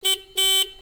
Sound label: stock horn